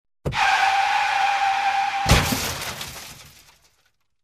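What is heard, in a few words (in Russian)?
тормоз и авария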